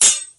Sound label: sword clash